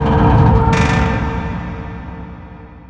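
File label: metal scrape reverb; ambient atmosphere; Counter Strike Model Pack; CS:GO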